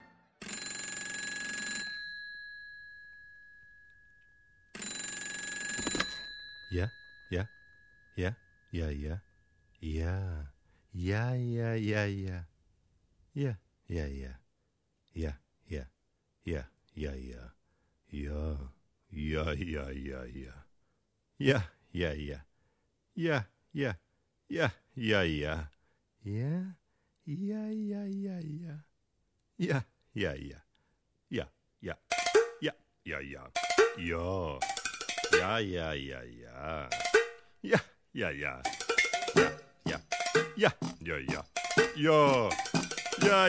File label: Tango